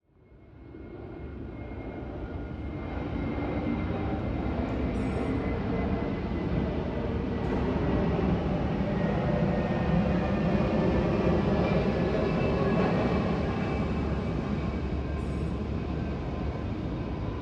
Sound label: Plane